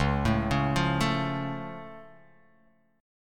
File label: C sharp Augmented down, guitar chord